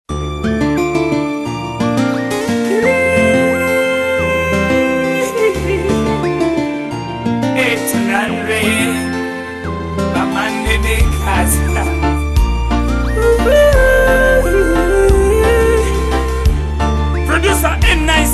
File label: Ugandan Music